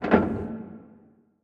quick Stop; godsoffortune

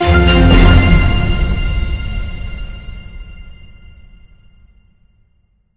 medium win

